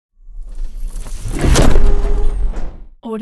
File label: robotic lifeforms whooshes mecha step sweetner; robotic lifeforms 2; soundmorph